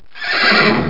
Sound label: HORSEY; landslor